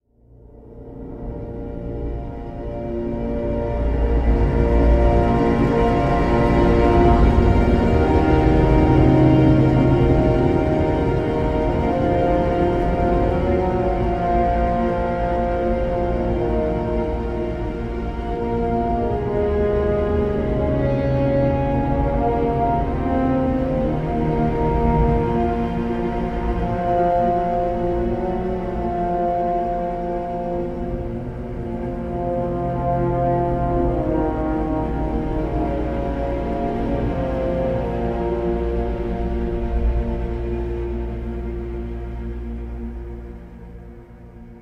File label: dark alt ambient; Heroes of the Storm; kingscrest